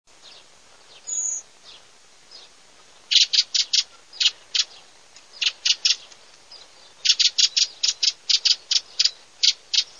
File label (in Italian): SASSELLO SCHIAMAZZO